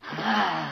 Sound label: wraith hurt; minecraft; technic